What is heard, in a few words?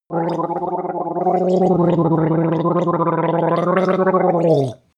gargle, Body sounds; koerpergeraeusche; menschen; soundpool